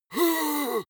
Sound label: monster growl attack; schreie